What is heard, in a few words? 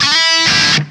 burst